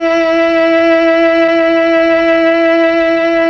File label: mello cello